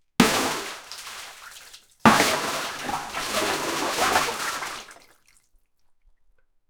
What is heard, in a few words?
many big buckets of wet, liquid